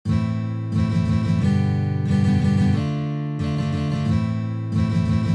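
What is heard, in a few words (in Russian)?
boj dlya kupleta, na gitare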